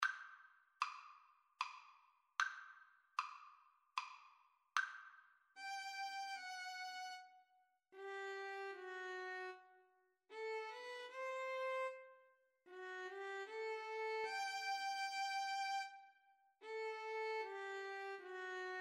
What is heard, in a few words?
Violin Cello Duet; sum 1st mvt four seasons